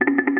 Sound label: Hand